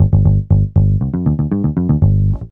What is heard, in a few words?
B-LOOP